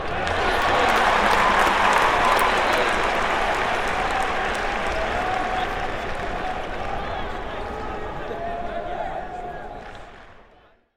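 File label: Arena Crowd Cheer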